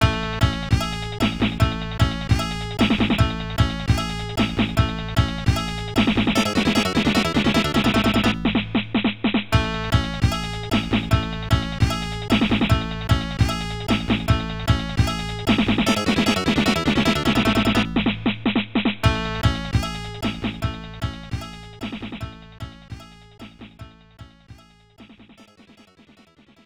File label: Lucky Slot; Cameltry; Taito B System